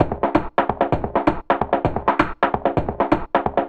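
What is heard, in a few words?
Filtered Funk, 130 BPM, Percussion; Sonic Foundry (Sony Creative Software); Underground UK House & Electro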